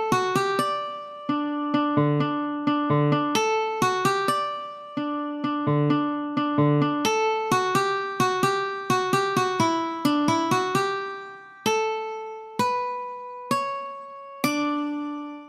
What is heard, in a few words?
Huawei Living Piano